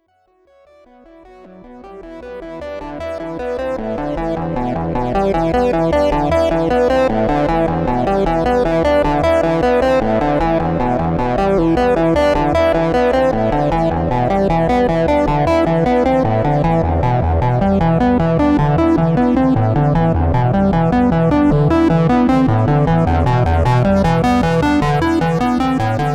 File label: Modal A8, synthesizer